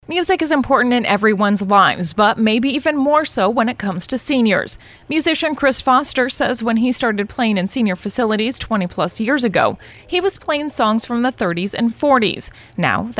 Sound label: SENIOR MUSIC